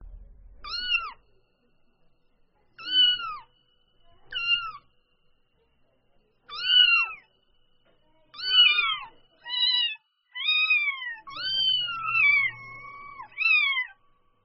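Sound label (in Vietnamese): tiếng mèo con mới đẻ kêu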